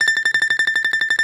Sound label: balalaika, Russian strings; World Combos; E-MU Producer Series Vol. 7 – Old World Instruments